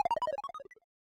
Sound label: Boss Core Hurt Stinger; Avie; Going Under